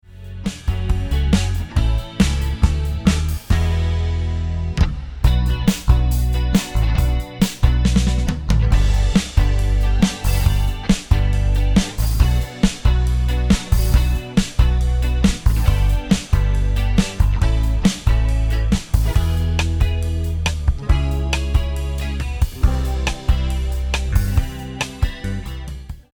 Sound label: Vocals Guitar